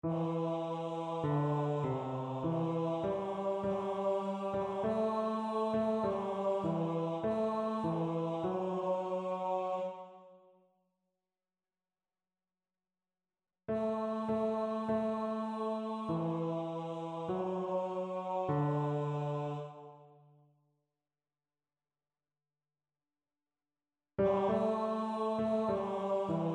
O Let Us Live in Joy bass

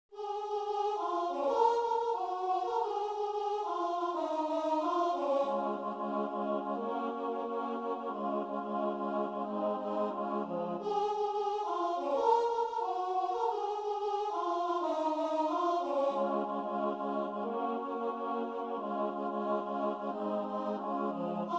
solo

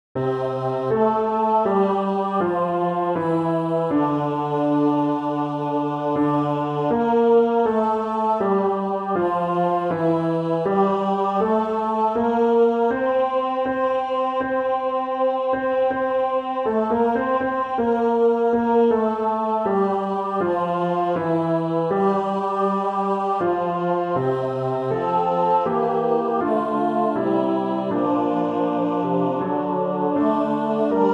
tutti

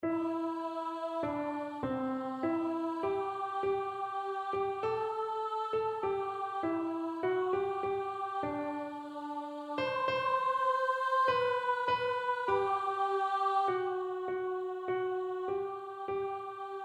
O Let Us Live in Joy soprano

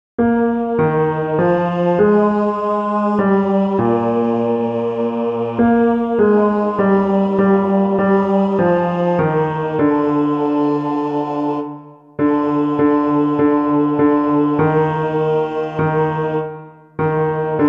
We Meet on Holy Ground bass

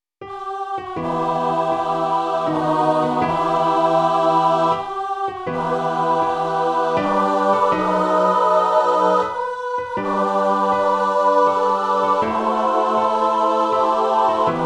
tutti

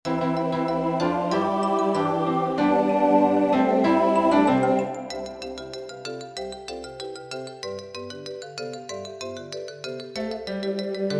tutti medium

